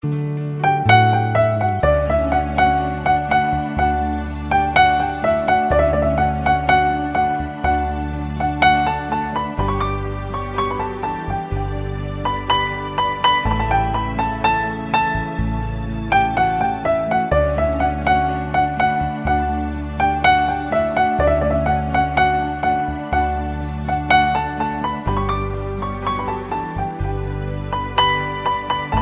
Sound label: Love Tone